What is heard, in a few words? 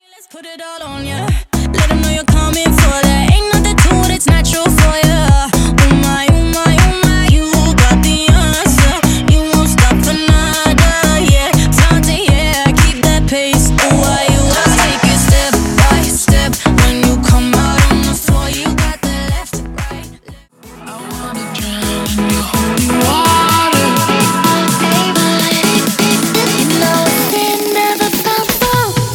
Energy Explotion